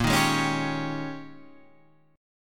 G sharp Major 7th Suspended 2nd Suspended 4th, guitar; 6,6,6,6,8,9